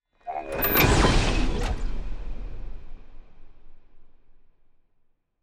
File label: ship construction ship move; Stellaris; shroud